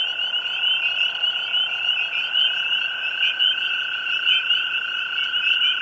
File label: spring peepers; FROGS